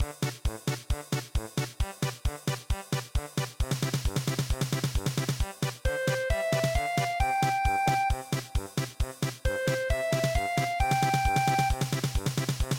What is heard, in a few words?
saints marching porka